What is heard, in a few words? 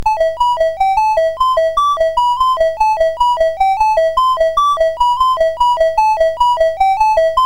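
Magicians Ball, MOS 8580 SID chip music, games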